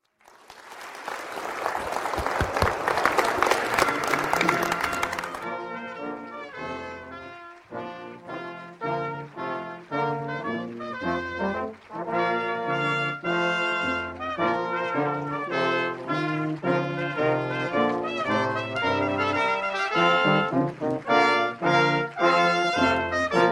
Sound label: Trumpet Tune